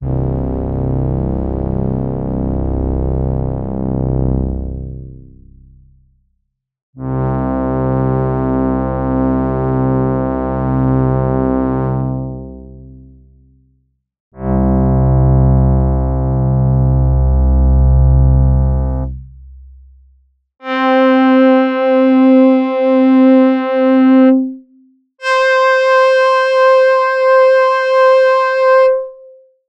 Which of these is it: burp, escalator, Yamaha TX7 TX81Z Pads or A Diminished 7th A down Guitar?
Yamaha TX7 TX81Z Pads